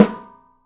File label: OPEN PICOLO, 3D Drums